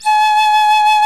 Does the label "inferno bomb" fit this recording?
No